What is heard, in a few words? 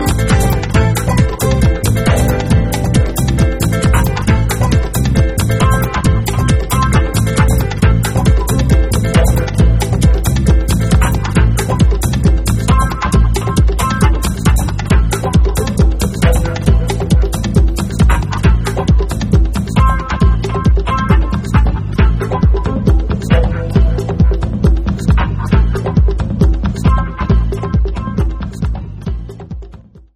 string